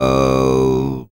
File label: SHORT, TUVAN LOW; ILIO; Vocal Planet VOL-5